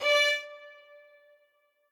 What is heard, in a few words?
string